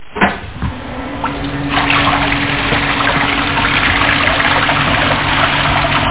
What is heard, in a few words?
water; A Matter of Time